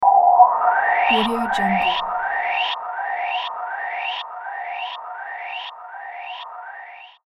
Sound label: dynamic title effect with electronic filter sweep